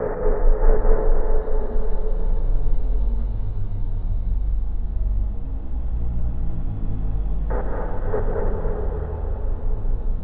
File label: Drone